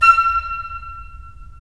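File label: FL, flute; LA Composer vol. 3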